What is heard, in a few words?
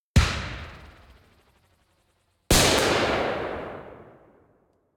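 mortar explosion, exterior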